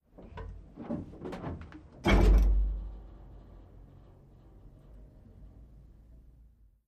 M60 Tank Int Hatch

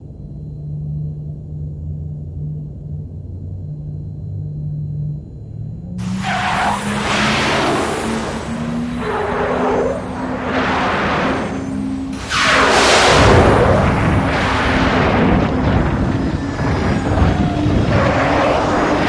Space Shuttle Into Cargo Bay and Crash

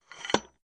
Tripod Leg Telescop

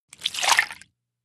Spill Liquid Water, splashes

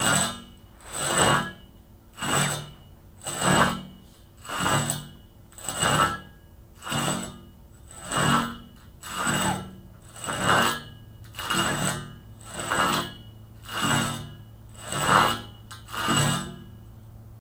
Pipe Drags

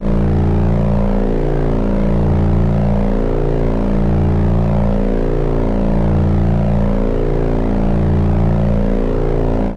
engine hum, engines

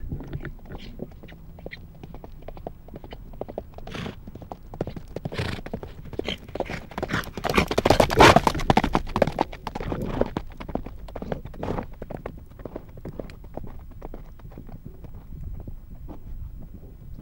Horse Gallops By

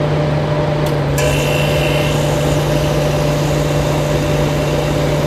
Dehumidifier Loop; fans big

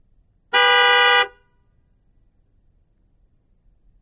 Expedition Horn Honk, ford expedition

